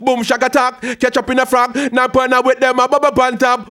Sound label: HAL RAGGAM, RAGGA-MUFF A maj; Sound & Vision - Gigapack II